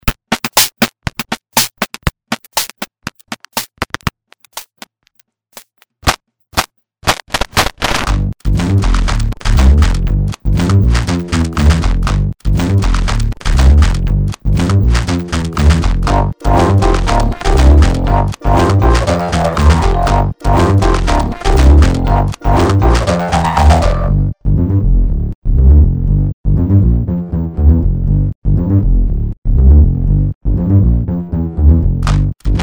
Groove

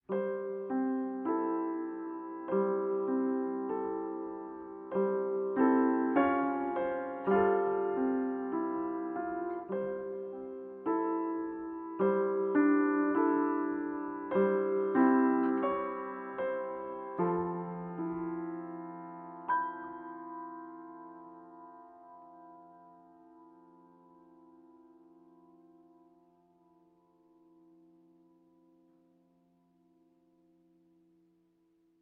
tune

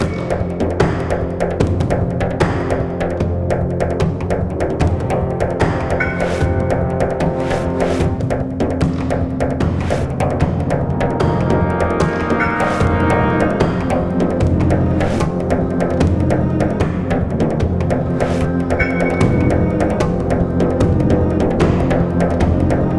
combat

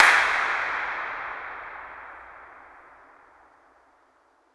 incinerator dirac

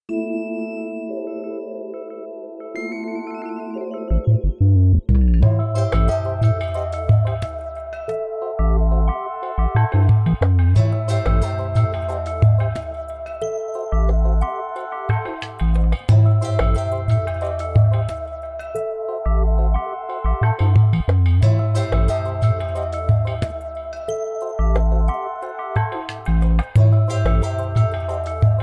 Fanfare

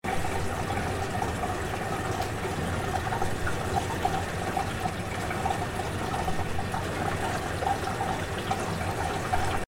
audio loop default

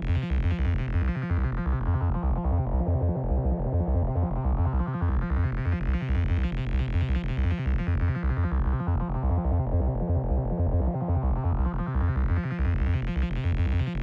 bad flux arps; freeflight

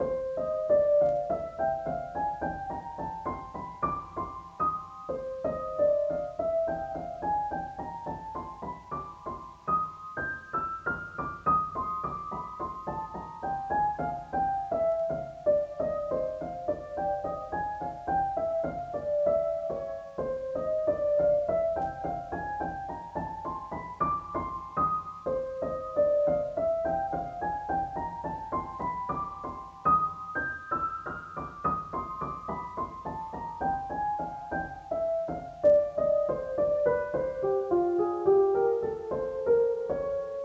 piano track

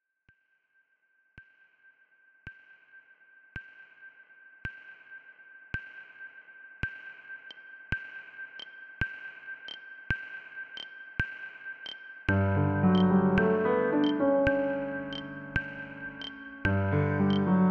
piano

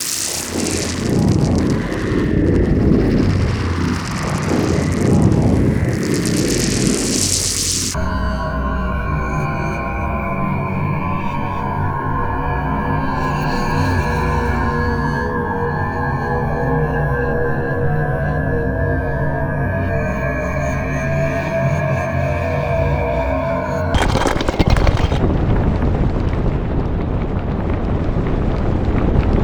loops; DS school project game

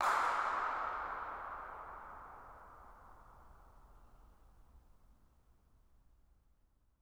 incinerator dirac

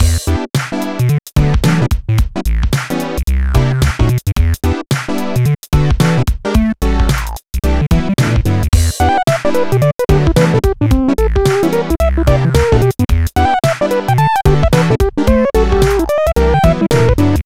menu; contactDx; unreleased puzzle game

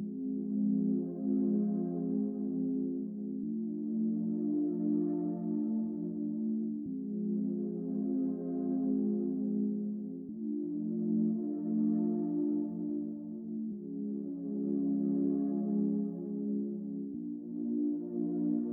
puzzle action loop layer harmo; freeflight

